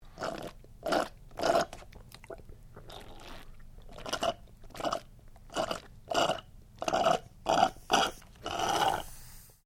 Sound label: MALE DRINKING STRAW SLURPING; Male Vocal sounds; Human